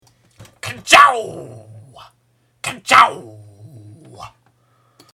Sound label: raygun